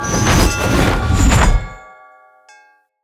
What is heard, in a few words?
Arena Crosser End Jump; Bromius